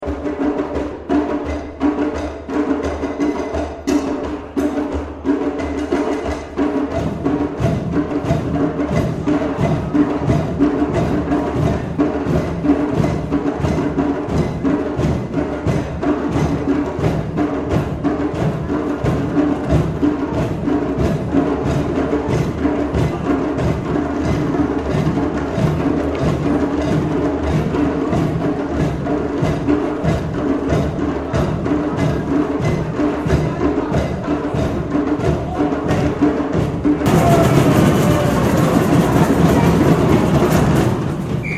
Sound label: Green Drums